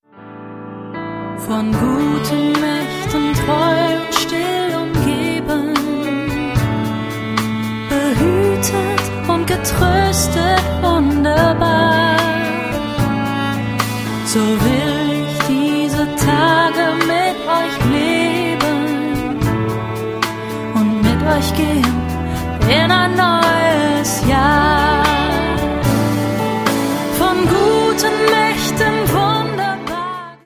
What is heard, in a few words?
choral medley